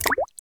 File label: WATER, INDUSTRIAL